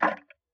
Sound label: Tick, Clocks Kitchen Clock